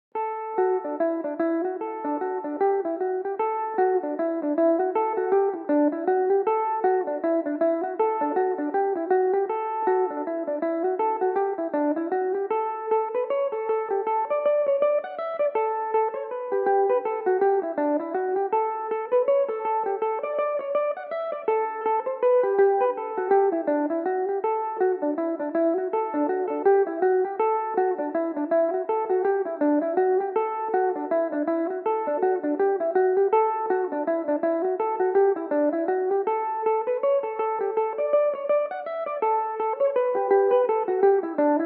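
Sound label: D major belmuse, irish mandolin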